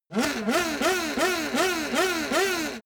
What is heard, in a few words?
racing car f1 rev fast close